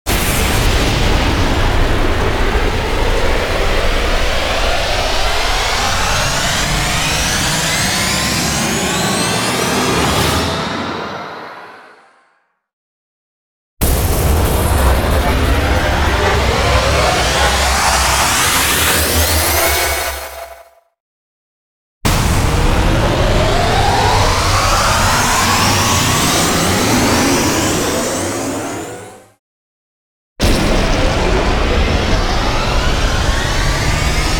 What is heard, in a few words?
Explosion Risers; Epic Tools vol. 1